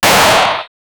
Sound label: 16 bit gun shooting